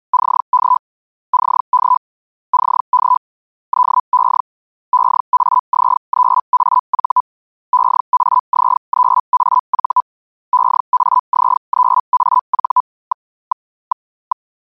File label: Feldhell CQ